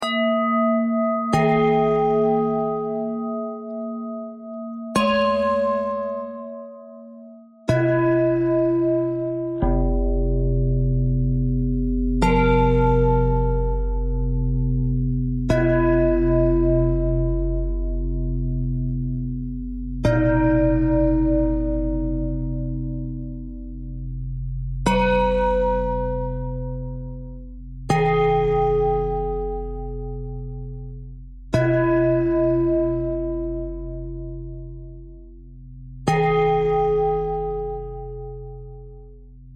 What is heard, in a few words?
bell threads